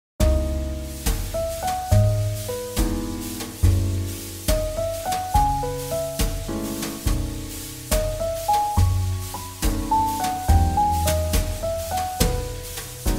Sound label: JazzBallad; 잔잔